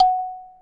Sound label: F#4 KALIMBA; AKAI S6000; WorldSounds